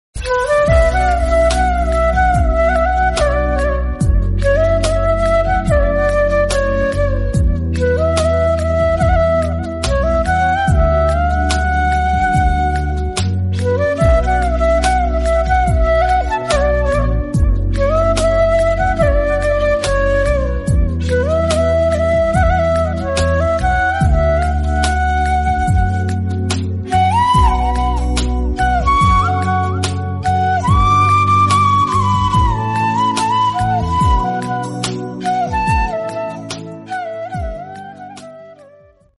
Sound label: flute instrumental